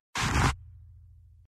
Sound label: put into stash